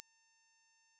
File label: 18000Hz; だんだん厳しくなっていきます。